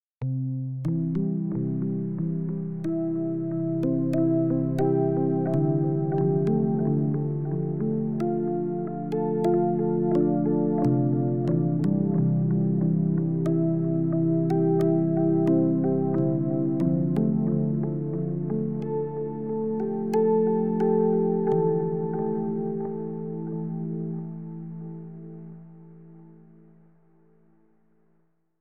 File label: synth delay bbd; valhalladelay